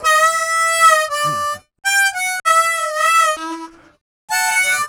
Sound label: Harm 098bpm A, Harmonica